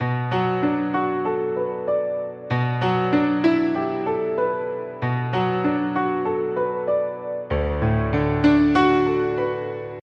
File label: Haunting Melody